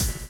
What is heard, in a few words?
DRUM CHOP; AMG Gota Yashiki Groove Activator